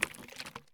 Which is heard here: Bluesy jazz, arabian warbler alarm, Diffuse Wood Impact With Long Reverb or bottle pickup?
bottle pickup